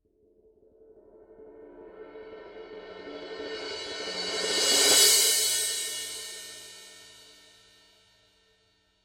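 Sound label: signature 15 fast crash swell, cymbales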